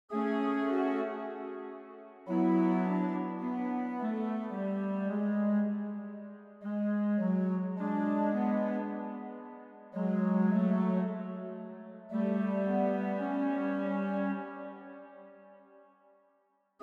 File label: saxophone